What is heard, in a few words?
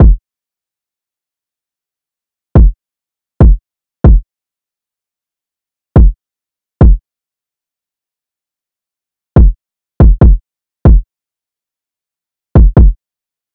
Drum Loop - 141 BPM Kick; Drum Loops; Loop Stems; Staggered; Cymatics; Mystery Pack Vol 4